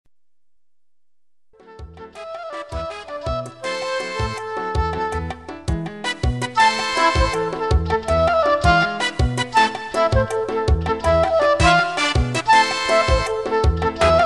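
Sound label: salsa, saxophone; Larry Clark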